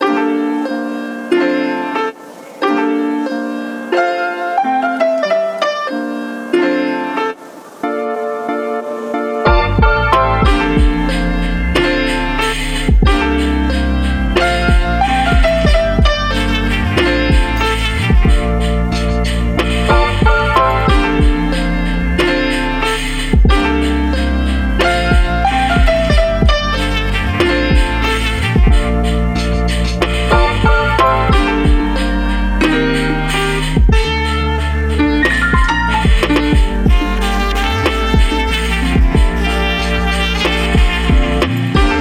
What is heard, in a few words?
Lofi HipHop